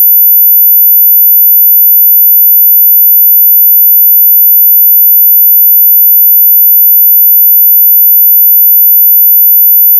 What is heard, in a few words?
sin 14000Hz